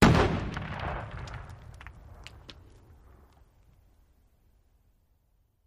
Bomb Explosions Sma, rock & sand

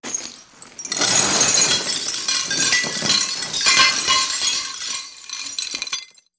Glass Crashes Reverb; windows & mirrors

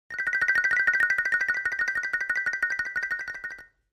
Xylophone Trill 4 Two, mallets xylophone

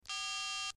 Telephone Cell Phone

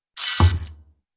Magic Wand Zap, zaps

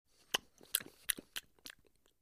Lip Smacks Lip Smack

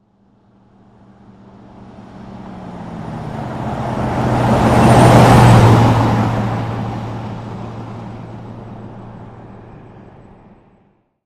Wagoneer 30 Mph By, jeep wagoneer

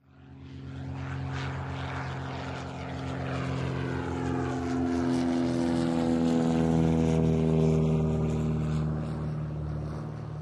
Airport Bg W Propelle

